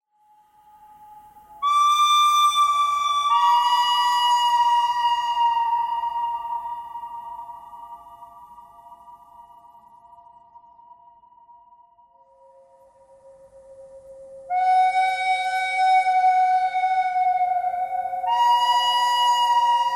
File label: bird cry thread buzz